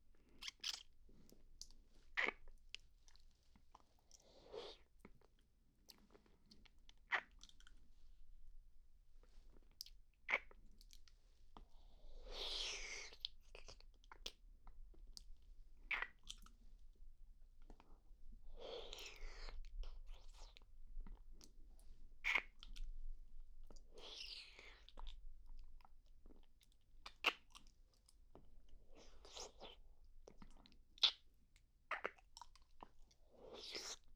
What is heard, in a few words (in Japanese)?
舐める